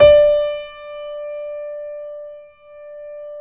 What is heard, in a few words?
D 4, Keyboards Acoustic, GRANDP ST; USB Soundscan vol.17